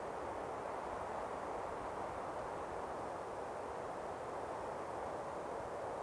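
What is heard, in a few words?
ambience Wind Light; Star Wars Obi Wan; XBOX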